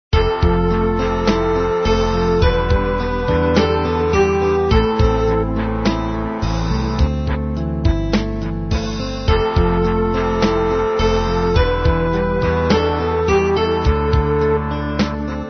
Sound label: midi